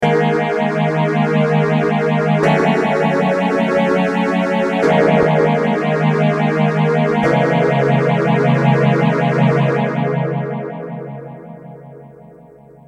Mutron phasor

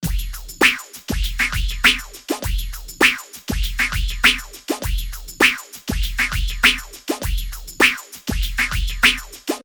drums Pigtronix

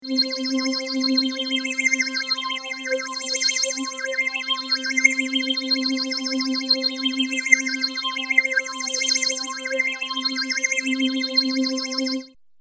Mutron biphase

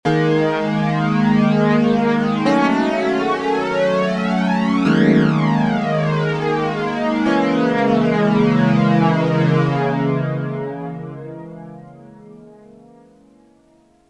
polyflange

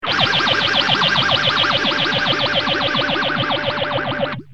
gtr

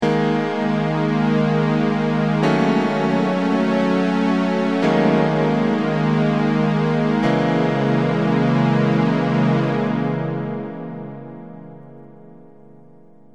m1000 sbf325

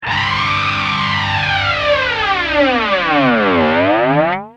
gtr ibanez fl99

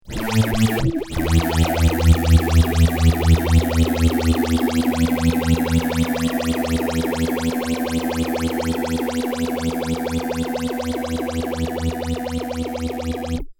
fuzz gtr

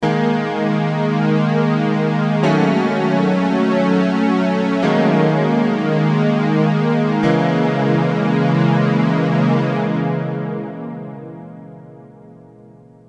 m1000 dimension c

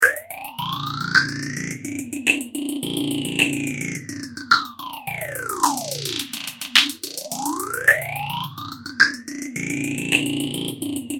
deluxe phaser

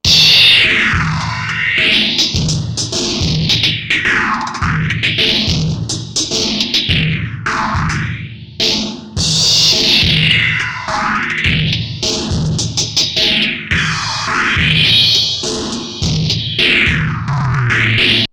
distorto beat tcxii re

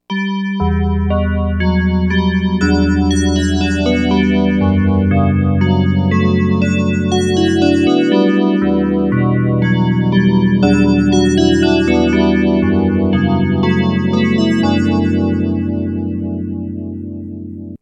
dx7 bells tc xii re

